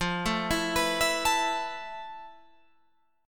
F Major 7th Flat 5th Guitar Open F, chord; arpeggiated

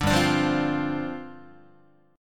G 7th Suspended 4th G down Guitar

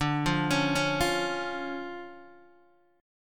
D Minor Major 7th D Guitar DADGAD

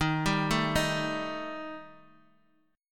D sharp Major Flat 5th down Guitar; D#Mb5 arpeggiated; 4F,4A,5C,5G,5C,6E; JGuitar

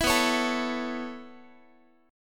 B 9th Suspended 4th B down Guitar; B9sus4 strummed; 4A,4E,5A,5D,5G#,6C#; chord shape; JGuitar

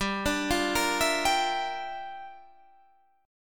G Minor 13th, chord; Gm13 arpeggiated; JGuitar

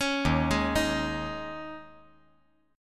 Eb 7th Suspended 4th; Eb7sus4; arpeggiated; 6,3,4,6; JGuitar

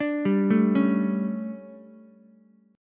D Major 7th Suspended 4th, Ukulele; DM7sus4; arpeggiated; JGuitar

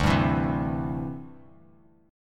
D 9th Suspended 4th D down Bass Standard; strummed; JGuitar

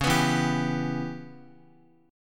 C sharp Minor 7th Flat 5th C sharp Guitar; C#m7b5 strummed

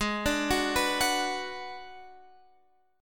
Ab Minor 7th Flat 5th Ab; arpeggiated; x,11,12,11,12,14; down

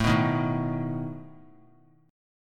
A Suspended 2nd Flat 5th A down; Asus2b5 strummed

